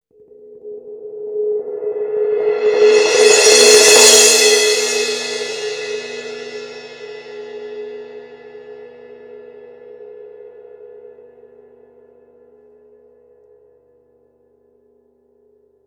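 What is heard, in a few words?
roll, Sabian 16" AA Molto Symphonic Suspended Cymbal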